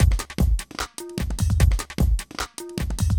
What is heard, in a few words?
glasto 150